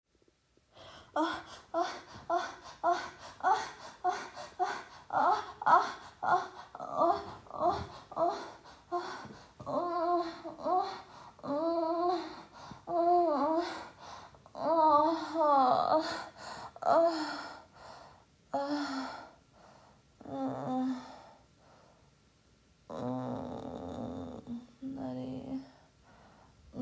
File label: Moaning